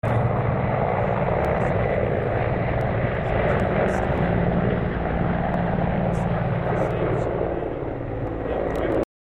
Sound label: mi35 takeoff